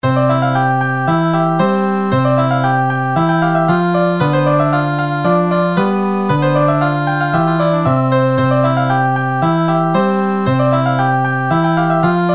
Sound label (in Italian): Tutti